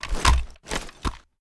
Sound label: gun butt hit; gmod